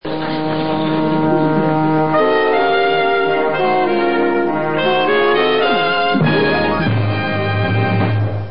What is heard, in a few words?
music